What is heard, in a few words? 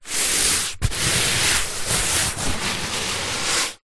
sport exercise mat foam hand rub